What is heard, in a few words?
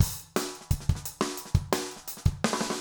jungle 170; drum loop; Ableton